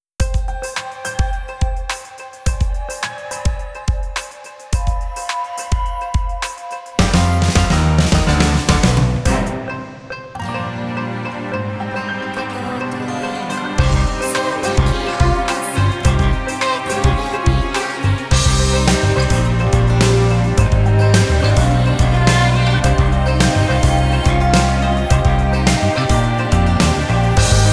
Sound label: Karaoke Version; Haison Shoujo ni Kageri Sasou Hime no Hako